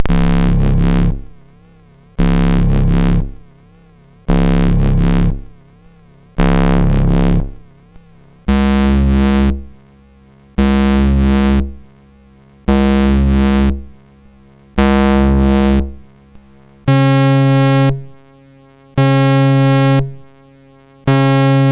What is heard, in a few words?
Synth Samples Bass, MOS6581R2